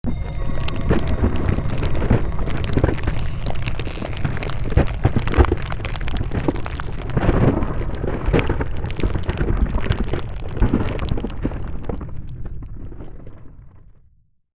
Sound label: Created Magic Spell Glacier Quake